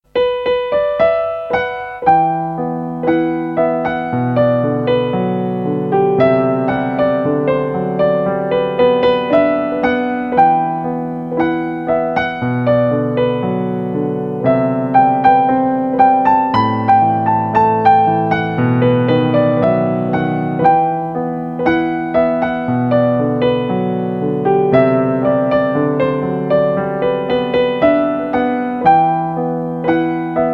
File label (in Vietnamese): Piano Khong Loi